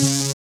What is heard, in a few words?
SYN, DANCE SYNTH